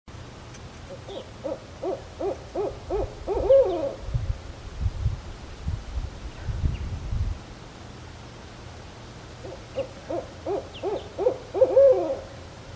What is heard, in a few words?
Barred Owl; Dorchester